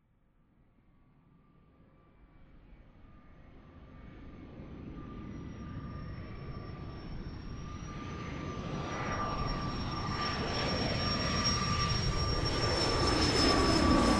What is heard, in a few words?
Jet